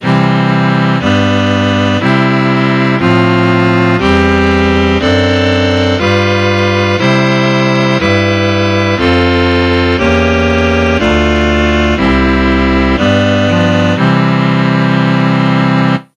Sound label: Pythag scale